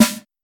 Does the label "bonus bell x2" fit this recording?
No